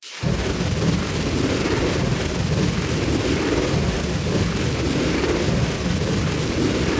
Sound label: jetpack; subwayrunner